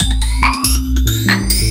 Tink Blips, Synchro Funk, Beats; Sonic Foundry (Sony Creative Software)

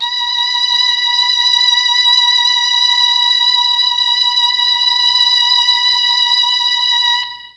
B3 3 violins, Mellotron MKII; Mike Pinder - Mellotron Archive CD

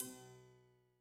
Rave Ride; Yamaha DTXpress-II

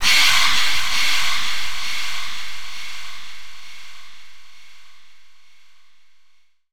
VOICE FX, Dance Vocals

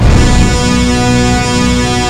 SWELL HIT; Kid Nepro - Elite

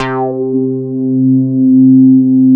C4, bass; Best Service Dream Experience; MONSTA

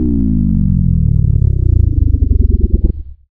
Synth Sting; musicradar

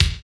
BD, Dance

